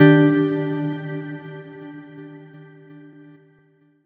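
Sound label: Guitar, Chords Tones; Equipped Music; Loungin House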